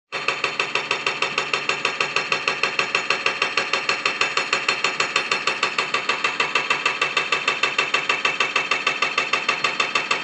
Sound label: hammer; old church school